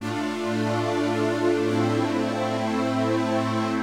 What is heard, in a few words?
SYNTHPAD HOUSE 125 A; eJay; Sphere